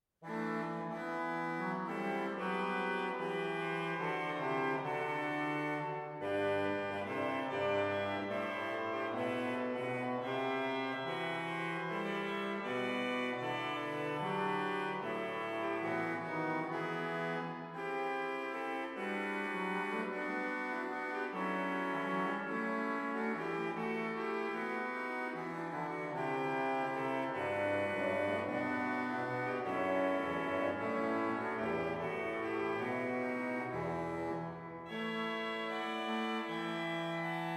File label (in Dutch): Hinsz-orgel klankvoorbeeld; Appingedam